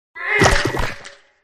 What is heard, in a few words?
umertvie boss attack voice bottom